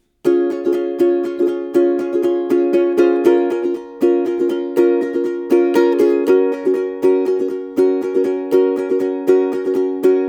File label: Pre Chorus Strum, ukulele